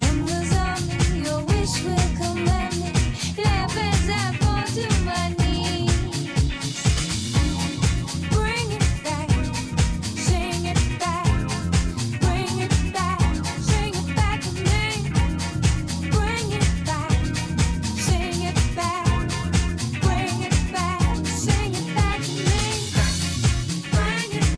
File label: Music